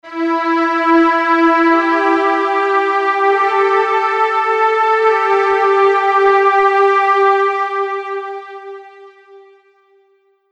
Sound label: EQ Low Audio